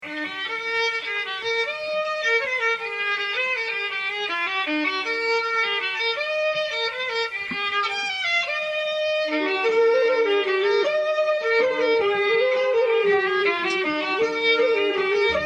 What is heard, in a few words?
two mazurkas